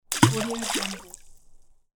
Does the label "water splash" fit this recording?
Yes